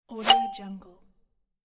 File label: tap swoosh